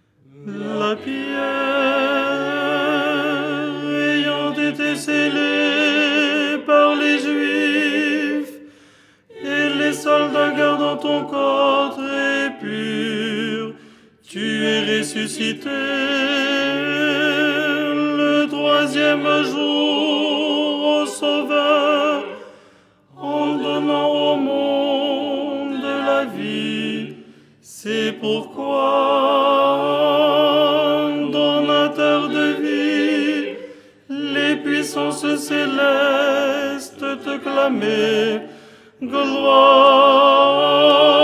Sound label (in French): tenor